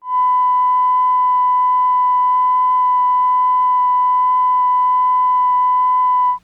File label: Opt Print 1KHz